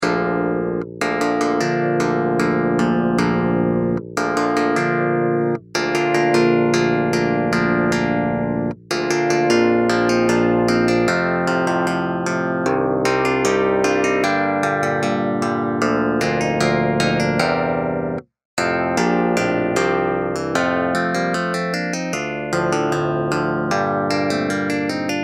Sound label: fanfare